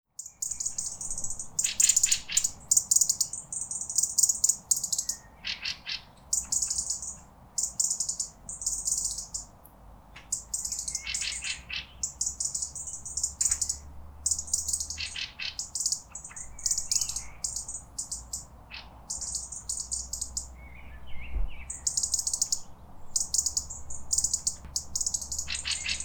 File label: Erithacus rubecula; SETE